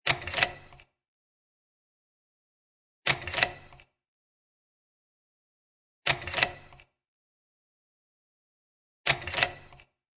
Slide Projector 4 Click, Four spaced clicks of a slide projector; Projectors; Offices